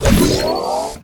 Grab Boost Small With Beeps; Player Ion Thrust

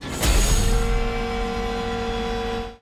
Platform Lower Start Loop; Scraprun; Deathrun

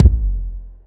Ball Collision Geo Extra Hard

